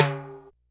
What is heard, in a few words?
Yamaha RX-120 Timbale Low